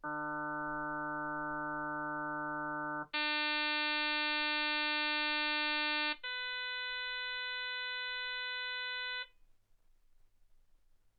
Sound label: harm sinus 48kHz